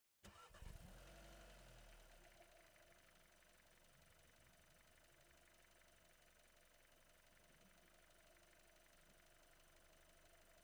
VW Golf - ONBRD - SLOW - Start Drive Stop Off Start Reverse Stop Drive Stop Off Drive; Pole Position Production; Volkswagen Golf R-Line 2018; VP88